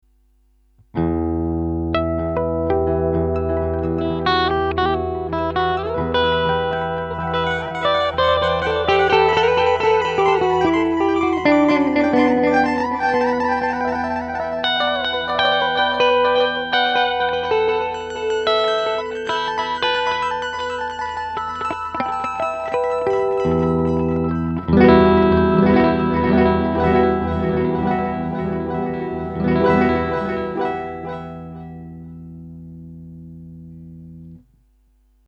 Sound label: git mosaiq; hologram electronics microcosm